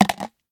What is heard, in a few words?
Drop Camera In Chute